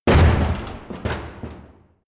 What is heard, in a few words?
crash large metal box; objekte